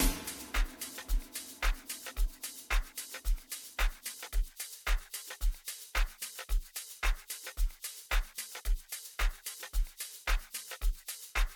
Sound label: Groove